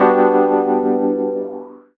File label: Chord; Blu Mar Ten; Jungle Jungle - 1989 to 1999 Samplepack